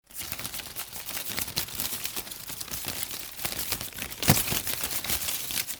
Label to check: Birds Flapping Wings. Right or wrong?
Right